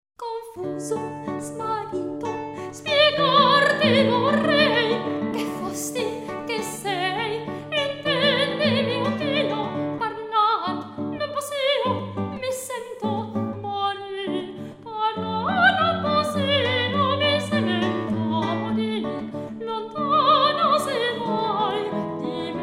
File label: Fade Out; ConfusoSmartito